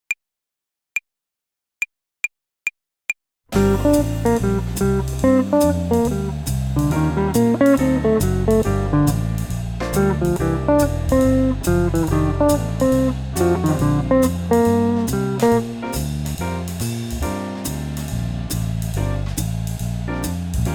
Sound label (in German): Swing-Phrasierung Übung 8, Play-along, Gitarre; Jazz Club